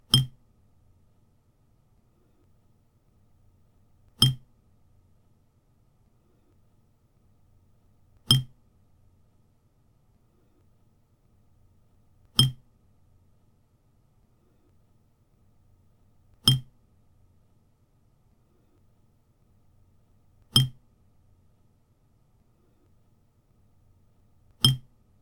drops on plate, 皿に水滴; 皿に水滴１; wetarea

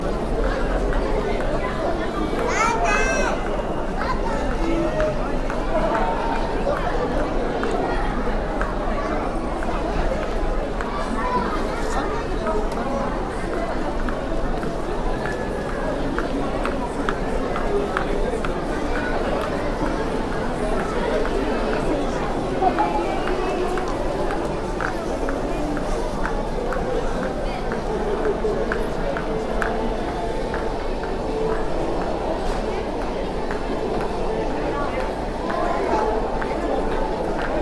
after game

